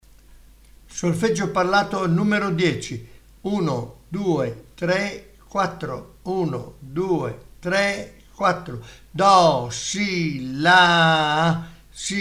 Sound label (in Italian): Solfeggio Il mio amico flauto